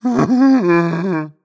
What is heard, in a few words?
breath long, zombie; creatures